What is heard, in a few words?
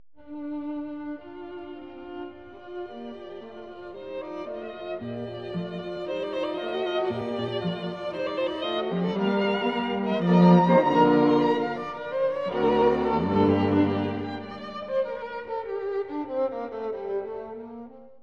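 string quartet; B-dur; 明るい; 若さにあふれ、少し上品さも感じる10代の作品です。; スーク・弦四1番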